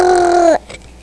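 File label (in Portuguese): PRAZER, SONS PEDIÁTRICOS; PEDIATRIA UFF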